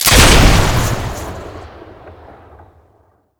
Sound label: artillery shoot; tf2classic